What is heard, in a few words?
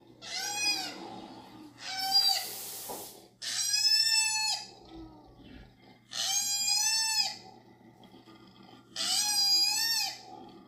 Koala